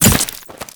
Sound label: Machete Finisher Stab Small; Sentient; Warframe; The New War; Kahl; Weapons